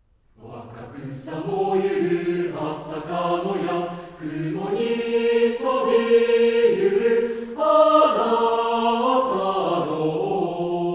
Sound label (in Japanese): 校歌 混声合唱; 校歌・応援歌; 福島県立安積高等学校